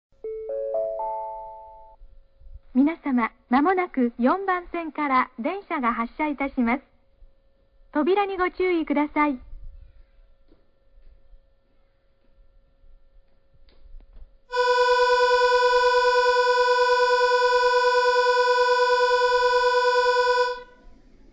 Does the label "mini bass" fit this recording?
No